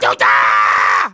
Fight; Gong

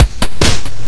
Beat loop, C#0; Guitar Rock Tour

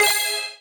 Block Combo; Ui Block Combo2

